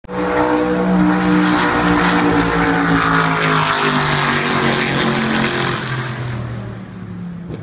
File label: Chevrolet Corvette C7R Daytona, ring tone; ring tone on cell